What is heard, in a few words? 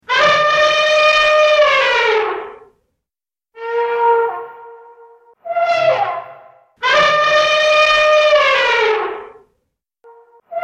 African Elephant